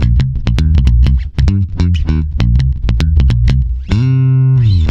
C, swing mute, bass; Spectrasonics; Bass Legends

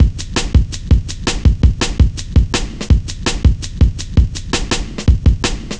Cut Thru B, Drum Loops, Jungle, 165.5

